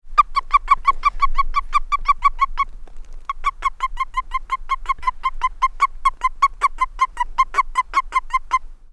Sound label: Anaxyrus boreas halophilus